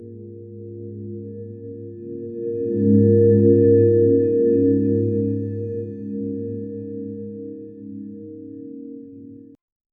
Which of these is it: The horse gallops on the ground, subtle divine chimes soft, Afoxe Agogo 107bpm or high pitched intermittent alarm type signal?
subtle divine chimes soft